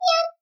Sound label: vfx Sad; nyan cat fly